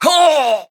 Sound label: pain; awesomenauts